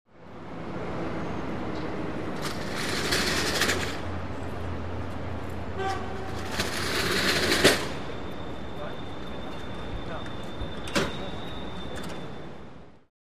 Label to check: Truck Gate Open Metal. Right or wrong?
Right